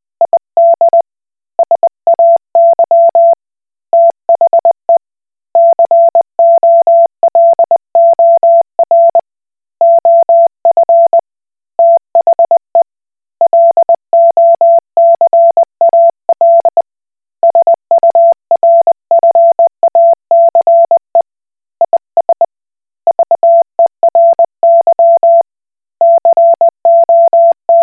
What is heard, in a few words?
Koch Morse